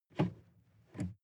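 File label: sports car interior pedal brake medium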